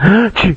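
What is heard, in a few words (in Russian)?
чихание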